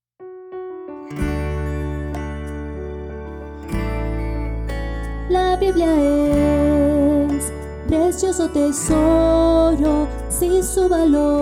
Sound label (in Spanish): Precioso tesoro